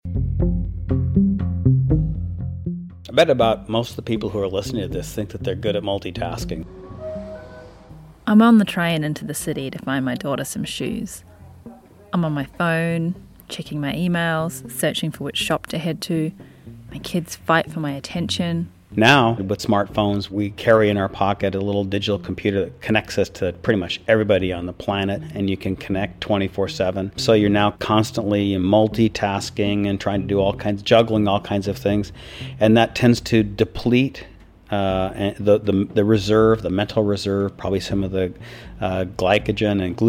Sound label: trailer mix